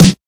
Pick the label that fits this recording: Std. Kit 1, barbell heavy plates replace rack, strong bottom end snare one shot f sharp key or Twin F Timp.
strong bottom end snare one shot f sharp key